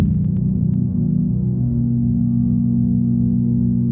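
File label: 122 BPM Drones B major G minor Tonic B; mmb studio soundtrack essentials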